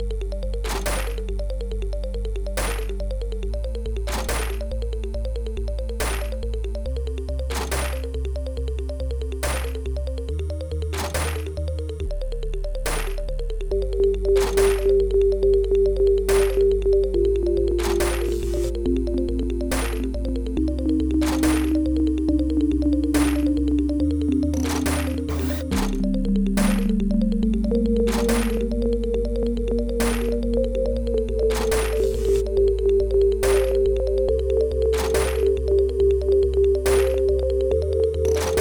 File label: Music Gear; Dreamball